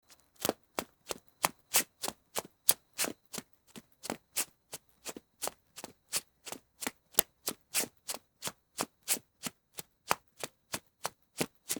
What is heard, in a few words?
step; ゴムサンダルで走る音（秋虫の声入り）